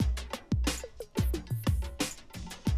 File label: Classic DrumLoops